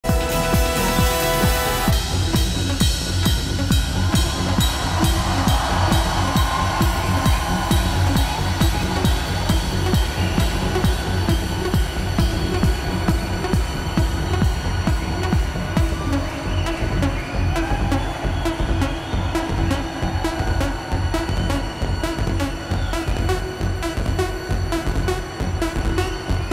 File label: orchestra; ibiza classics live